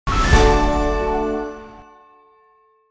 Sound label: Win; Clover Fantasy